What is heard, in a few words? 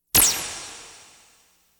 Science Fiction effects, Lasers, Light beams